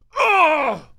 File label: Death; Cup Hand and Head Adventure; Android gamerip